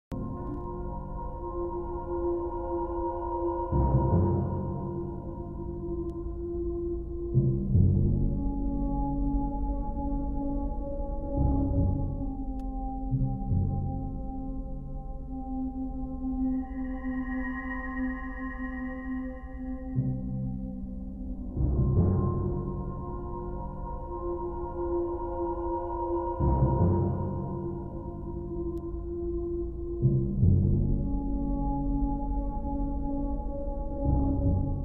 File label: Creepy